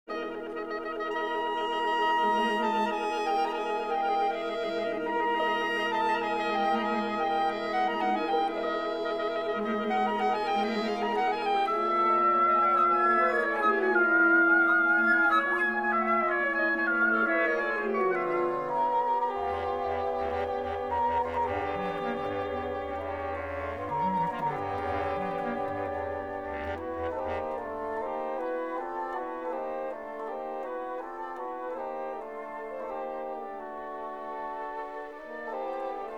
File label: Instrumental, Woodwind ensemble